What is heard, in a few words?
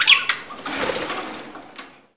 bird